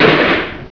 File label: G'bye Elevator; Stardust 1.1